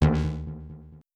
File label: bass